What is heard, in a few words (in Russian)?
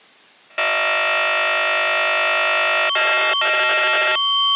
preambula